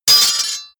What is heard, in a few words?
sword parry metal